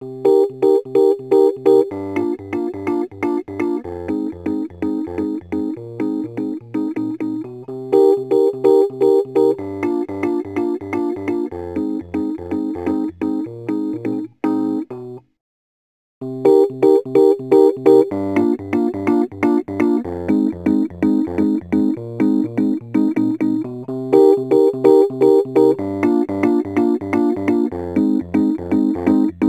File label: Rhodes Attacks; Ueberschall